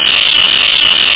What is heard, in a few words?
Electric Shock